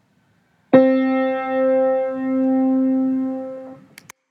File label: sop ii c